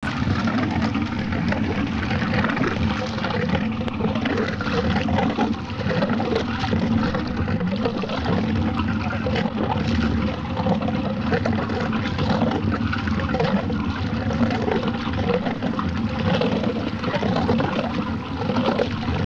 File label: Idiling Boat